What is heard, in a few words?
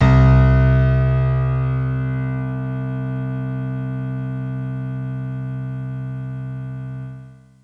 PIANO C3; Orchestral; FL Keys; Piano 1